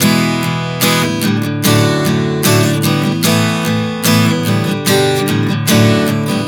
Prog 074 E D Asus9 G, Acoustic Guitar, Chord Progressions 074 bpm; Sonic Foundry; Songwriter Acoustic Guitar Companion